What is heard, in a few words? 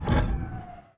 Player Conveyer Release